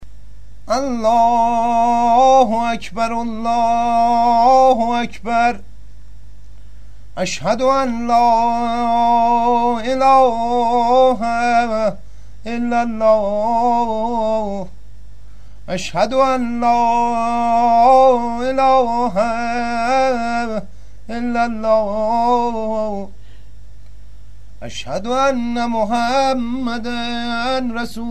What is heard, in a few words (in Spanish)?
azan llamada oracion; Musica persa; Baiate-Tork